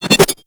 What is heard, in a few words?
Tonfa Parry Whoosh; Warframe; Tenno